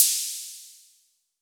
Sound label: HHo Blofeld; Waldorf